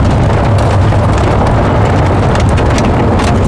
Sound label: GRAVEL, SURFACES; ImmersionRacing